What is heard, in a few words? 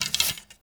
Hunter Bow Reload, bows; Weapons; Enemy